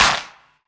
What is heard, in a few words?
clap one shot f sharp key, Royality free hand clap tuned to the F# note. Loudest frequency: 2970Hz; single hits one shots